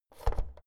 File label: oven knob press out hard